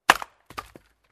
Single Wood Chop

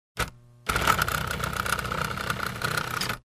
Electric Driller

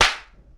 Heavy Face Slap

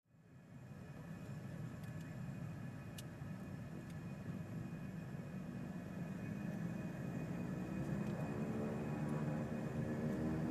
Cabin Ambience with Propeller Hum

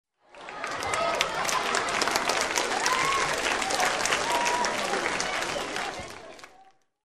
Audience in a show cheering and applauding

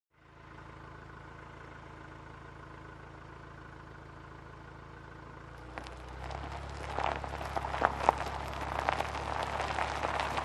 Driving on Gravel with open Window

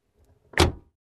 Closing Car Door

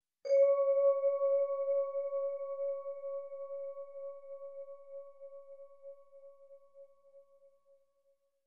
Long vibraphone info popup sound

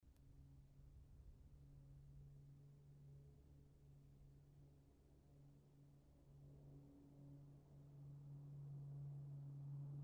Low Flying Aircraft

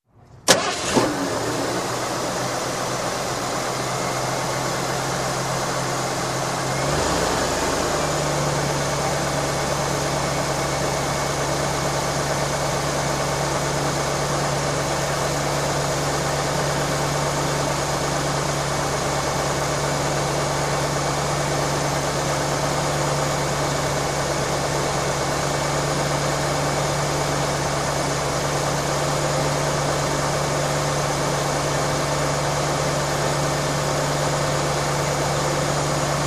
Car engine ignition and running

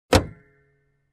Closing compartment in car

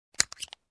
Old Camera Shutter Sound

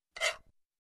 Guiro single stroke

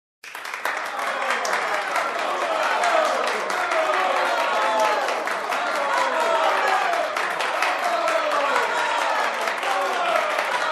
Crowd applauding bravo

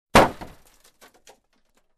Fell off the Roof Sound

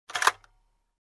Camera Tape being inserted

